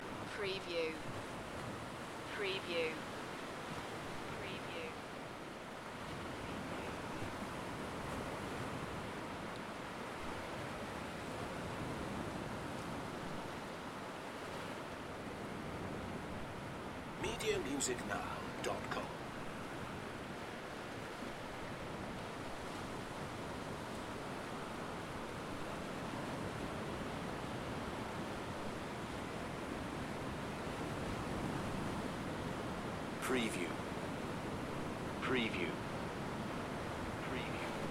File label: Spanish waves at night